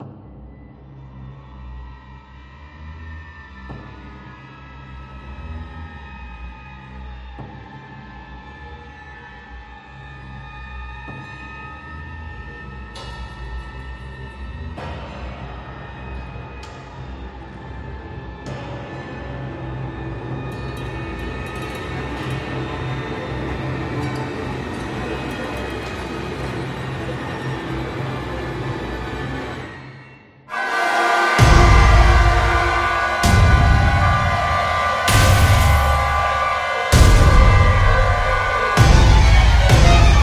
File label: Tension